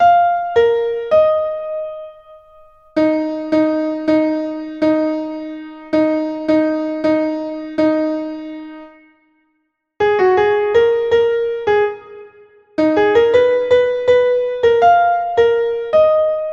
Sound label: Piano